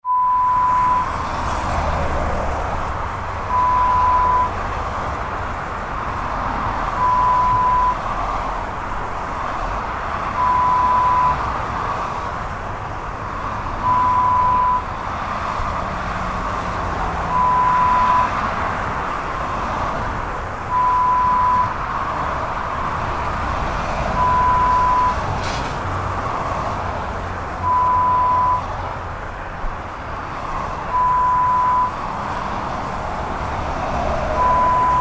motorway sounds

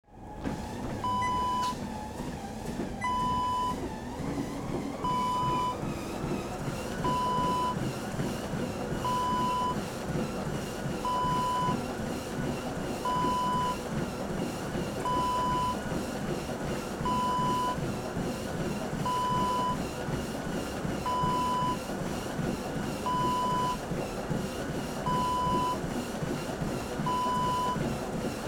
treadmill run

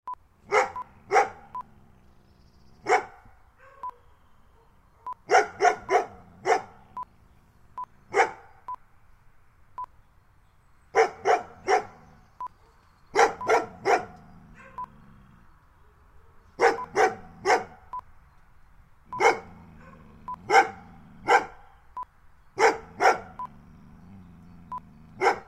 Large Dogs Barking Exterior

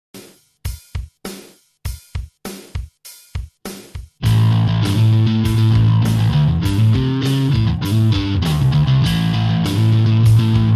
Distorted pentatonic lick in Am

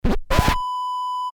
record scratch

